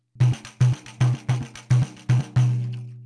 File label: Caixa, pandeiro